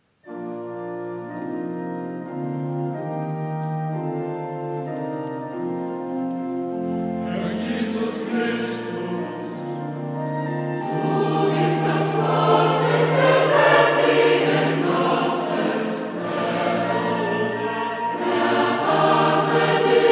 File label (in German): Christus Ruf Kyrie